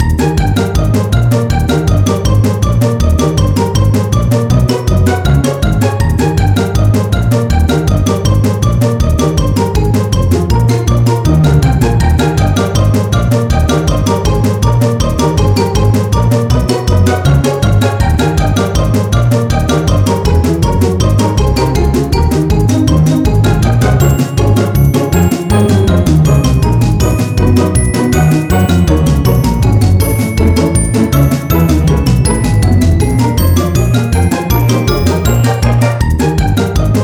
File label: Scribble Music; Spanish